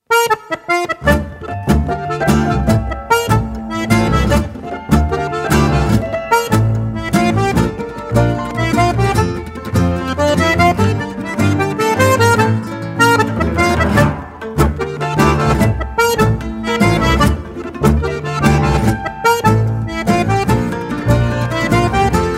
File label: String Tango